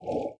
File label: Paddle